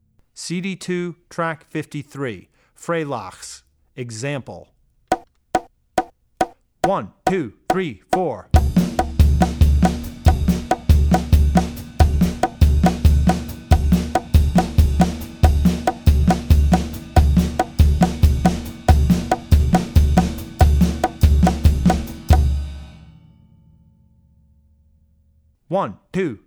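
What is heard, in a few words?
Klezmer - Freylakhs, Drum Tracks; Drummer's Bible Tracks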